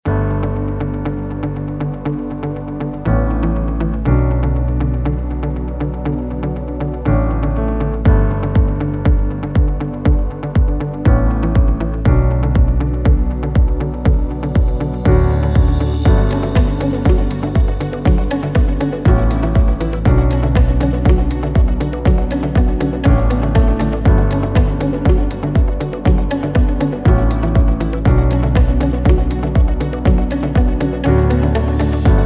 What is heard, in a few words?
Chilled Ambient